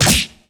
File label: Smash; Manzai; Puyo Puyo Puzzle Pop; iOS, macOS, mobile